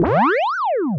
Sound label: Small Points; PubQuizKnockout